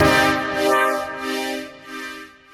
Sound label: Old School Stab, Brass